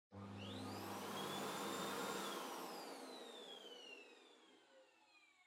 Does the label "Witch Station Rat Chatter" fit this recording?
No